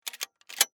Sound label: Weapon Gun Cock Mic At Barrel